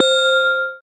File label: synth c, Top End Tinklers; Dance Synths